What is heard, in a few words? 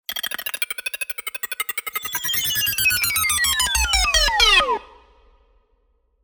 Data calculations